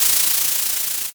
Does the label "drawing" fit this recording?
Yes